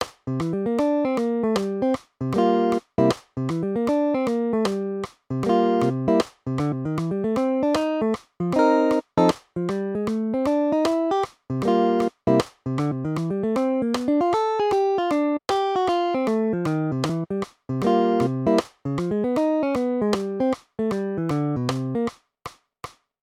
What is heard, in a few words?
blues; 4/4; Triplet 8th; They can all be played with a pick only, without using the fingers